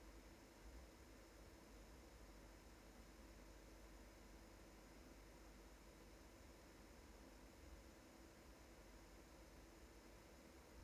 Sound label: S80 noise, видеоускорителей; Moore Threads MTT S80; Moore Threads MTT S80 и S70